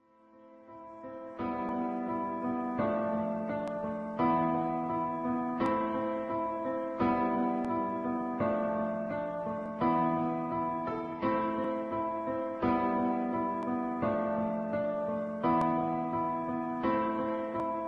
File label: sad emotional piano beat